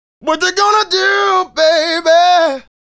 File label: Male Solo, Vocal